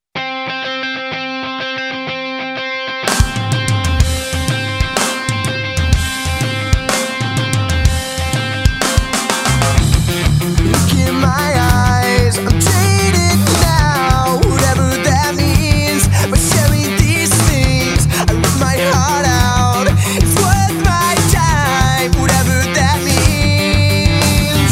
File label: noise and kisses